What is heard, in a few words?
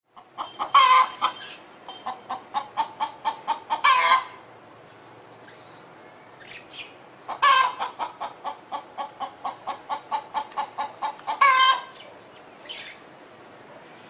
Hen And Birds